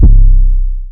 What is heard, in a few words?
hard plugg 808; drumkits; fl studio; slayerx drumkit